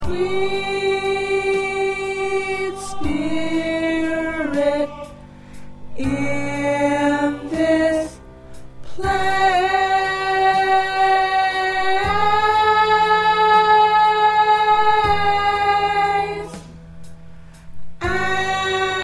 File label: Alto; spirituals and congregational songs